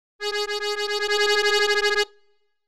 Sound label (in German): repetition sample